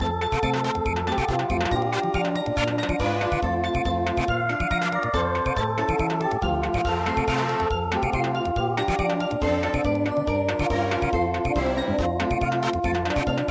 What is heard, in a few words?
Samba; midi; traditional